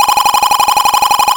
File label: shoot loop, attack sound; カタナ１